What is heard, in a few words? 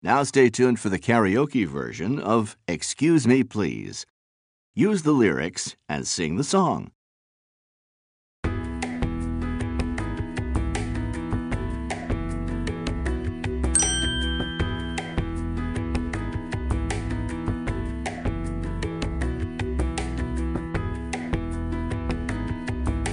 Karaoke; Luyện Nghe Cơ Bản